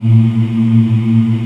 African Mm f; Roland LCDP11 Africa VOL-1

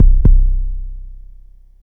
Sub Kick, Chillout; Sonic Foundry (Sony Creative Software); Afterhours EDM; Chillout Service

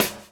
DOWN, DOWN KIT 78

